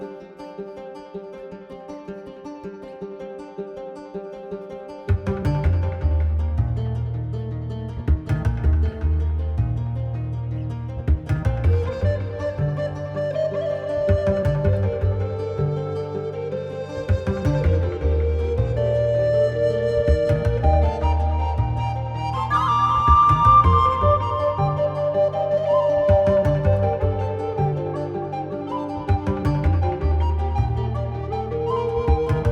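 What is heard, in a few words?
forest background music spooky loop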